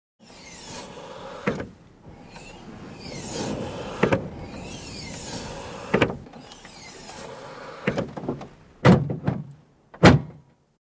Car Hood O C Bmw Hiss, Car Hood Open And Close; BMW Hydraulic Hood; BMW Hydraulic Hood With Piston Hiss, Close Perspective